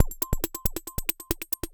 percussion loops, Techno; PS-45

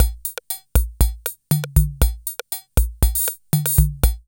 BOSSANOVA, KR55; INIS - Funky Xtreams; KR55 LP2

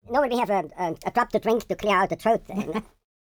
YODEL YELL, World Voices; ILIO; Vocal Planet VOL-5

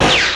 ZAP NOISE; Crypton; DrumBase5000; PERC.COMBI

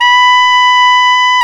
SAX TENOR

